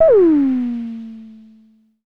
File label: Drum X FX, Pearl